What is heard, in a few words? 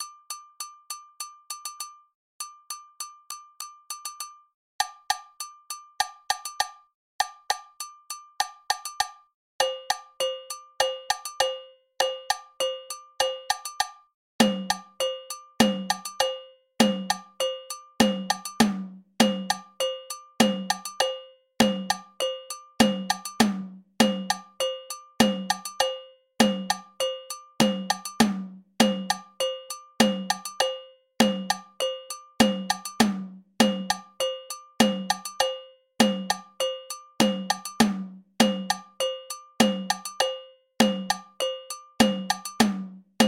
Funny Fugue